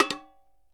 CAN HIT; 1000fx

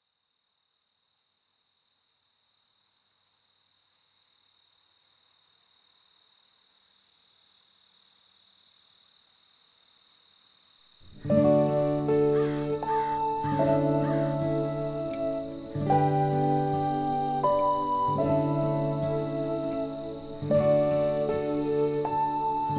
Forest Cello

